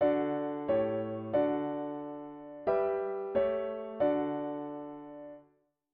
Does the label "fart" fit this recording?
No